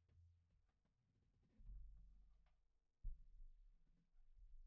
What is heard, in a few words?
Kick In